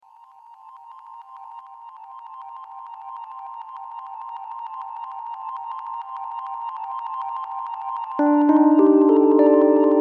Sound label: electro jazz minimalist melancholic